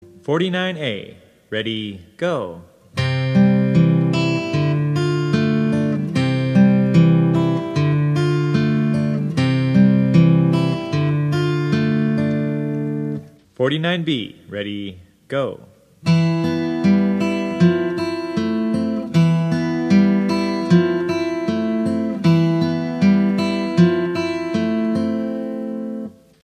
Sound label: travis picking